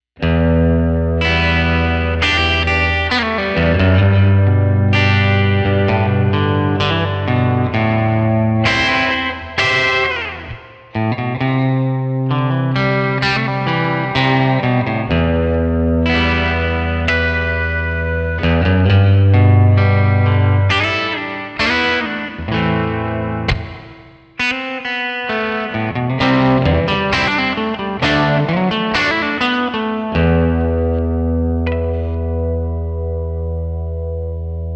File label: chordwork